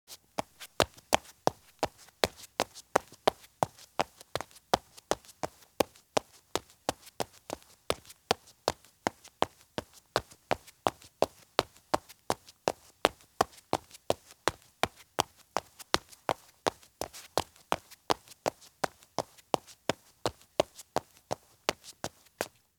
Child in Running Shoes Walk at Fast Pace on Concrete; Sound Ideas; Footstep Sound Effects